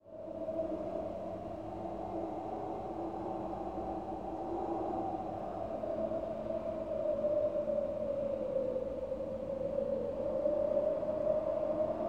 Wind Loop; skykeepers; ps4 windows xbox one gamerip 2017

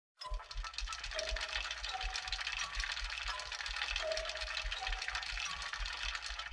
Mountain SFX, Mech Gondola Moving Wheel

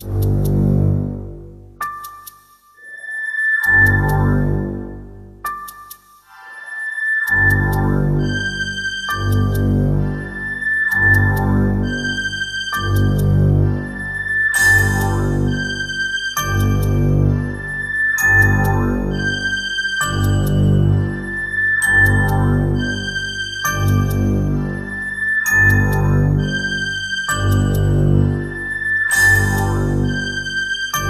BGM; Adventure Player; psp

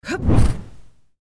Trample Random; Goddess of Trampling

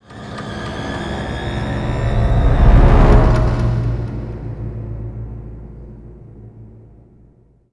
reveal; Star Wars Bounty Hunter

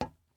glass step

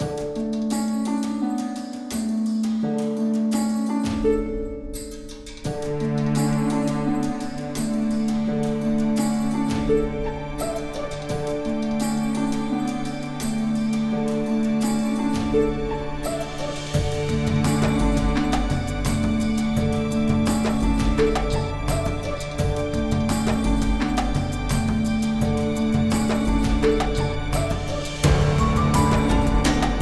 Snowland Loop; Romeow in the Cracked World

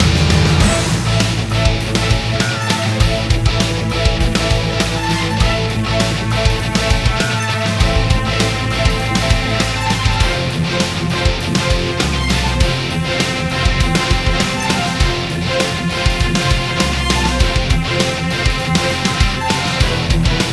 TENSION

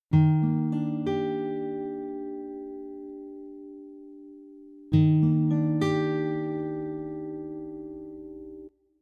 duet guitar base head 100bpm Eb Major; chicory a colorful tale

